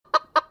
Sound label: Chick cluck short